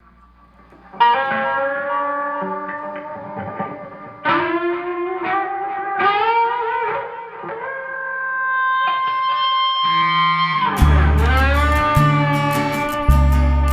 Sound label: Siren Song